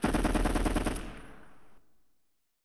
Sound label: dist gun burst